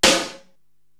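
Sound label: snare drum Raw Rocker, Drum Machines, Korg TR-Rack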